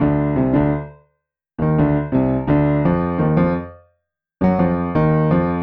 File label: jazz keys AcPiano 85 B-line n Riff D